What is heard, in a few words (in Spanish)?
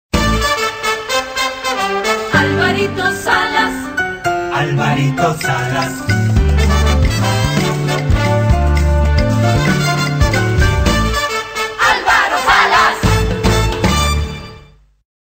cancion principal, cortina; alvaro salas